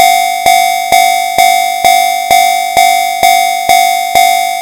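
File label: fumikiri, railway crossing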